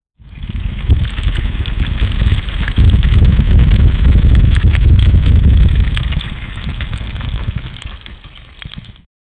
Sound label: fire coming out of five, breath